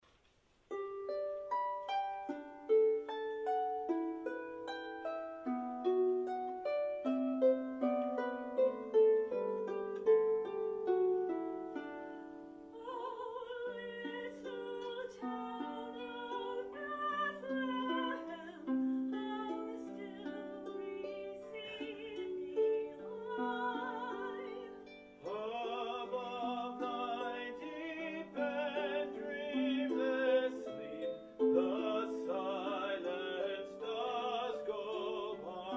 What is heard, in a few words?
O Little Town of Bethlehem w harp